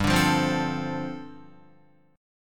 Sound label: G 9th Suspended 4th, Guitar; strummed; G9sus4